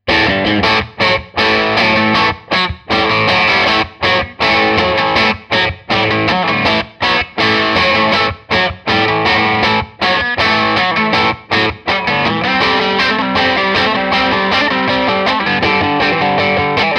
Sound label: Crunch; Koch Amps Little Gristle